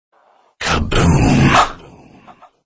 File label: zombies "kaboom" sound, ringtones; black ops